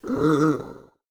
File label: idle, zombie; DayZ-Epoch; dayz sfx